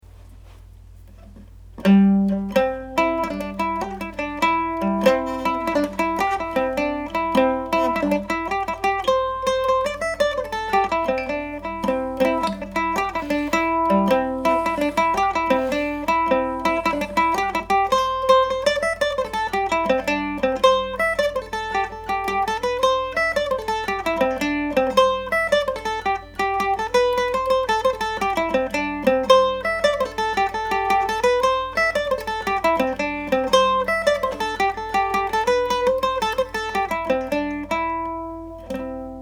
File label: hole in the hedge, Irish Mandolin